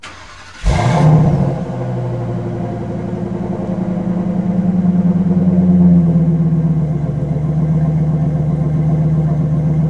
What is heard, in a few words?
Truck Ford V10 Start Loop; Trucks